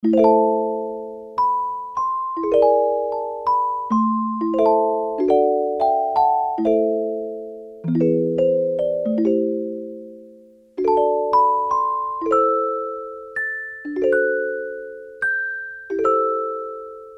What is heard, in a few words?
celesta; sampler; Guess the sampler game